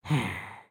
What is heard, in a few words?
Idle Bored, creatures organic; Lego; Strombie